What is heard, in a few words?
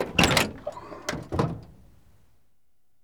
LOADING, Transportation Doors Hoods Brakes